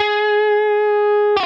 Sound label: Left-hand vibrato